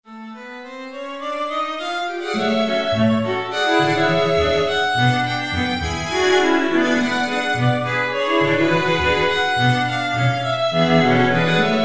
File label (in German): streichquintett